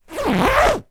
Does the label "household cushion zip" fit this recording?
Yes